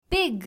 pig; domestic and wild

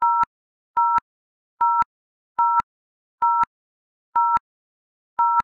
dial; grade3; samman

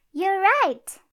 correct

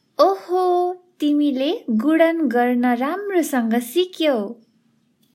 yayy; grade1; math; multiplication

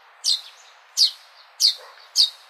sparrow; english; describing animals

